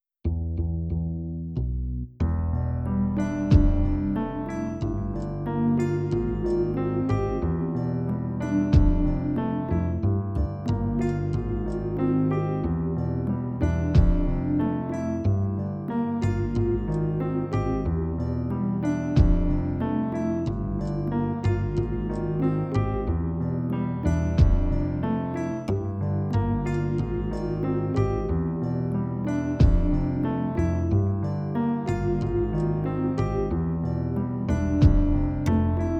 E And G Phrygian

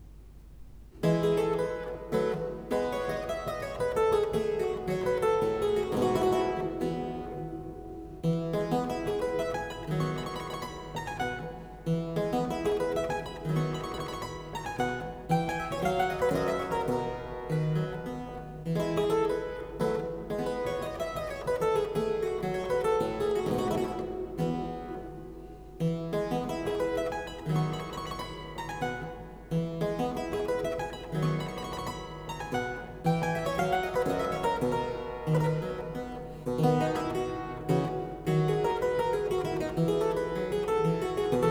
Allegro in F major, Keyboard Works; Mozart

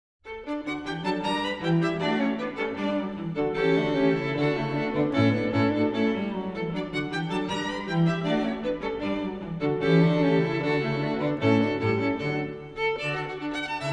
String Quartet in D major, Molto Allegro; Mozart; String Ensembles

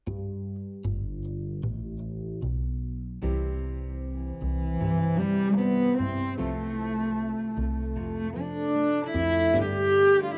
C Progression Improv

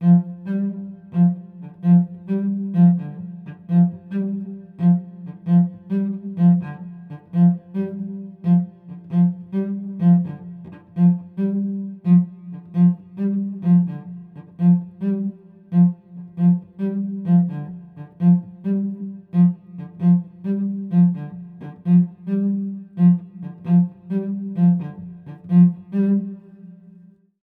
Hocketing

